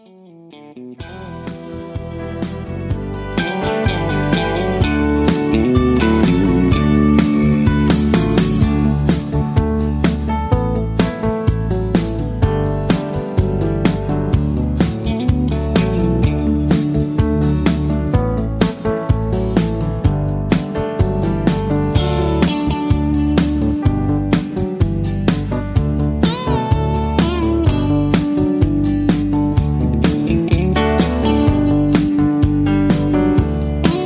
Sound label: Country Instrumental With Melody